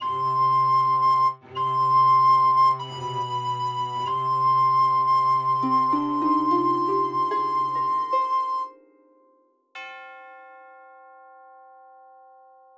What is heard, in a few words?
sad ending